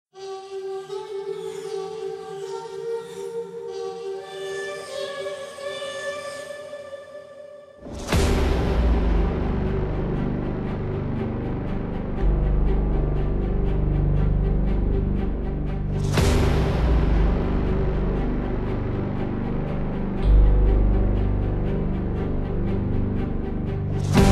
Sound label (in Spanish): Banda Sonora De Suspenso y Miedo Musica Instrumental; para trailers películas y cortometr